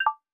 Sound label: pick falling